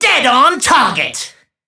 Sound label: Hit Confirmation; Starscream; Entertainment